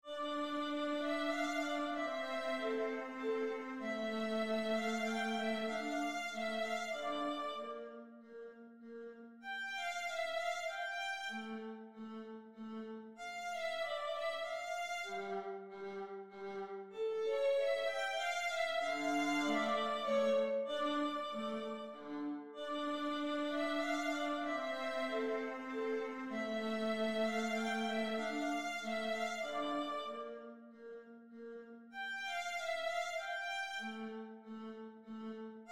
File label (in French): menuet en re mineur; mozart leopold